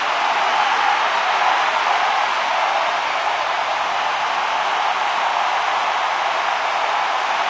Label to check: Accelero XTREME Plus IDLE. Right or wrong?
Wrong